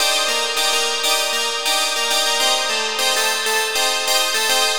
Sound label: Funky Bells, лупы